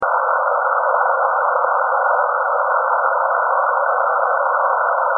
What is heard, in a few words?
Force Loop; gravity-force-lab-basics